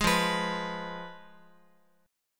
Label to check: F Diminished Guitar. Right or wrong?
Right